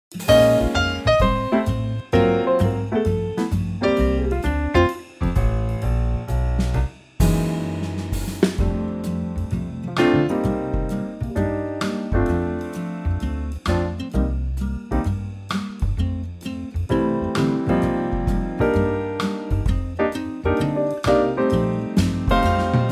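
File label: F Quartet, backing track; key - F - vocal range - C to Eb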